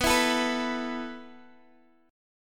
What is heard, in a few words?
B 7th Suspended 4th B down Guitar Drop D